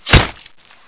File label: axe hit slash